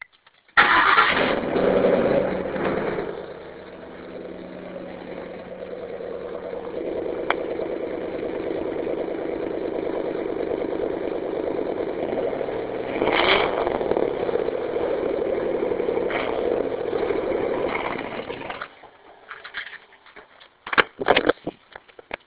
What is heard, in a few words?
car, Recording from my phone, Ford Crown Victoria